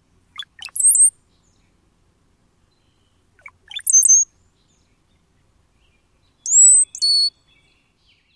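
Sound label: Brown-headed Cowbird male song and whistle call; NY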